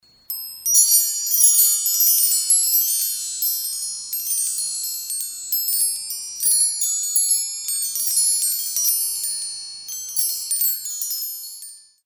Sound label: Chimes; Woodstock Evolutions - Omega Chime, Large